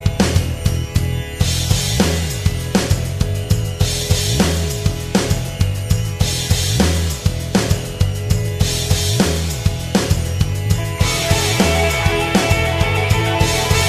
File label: beat heat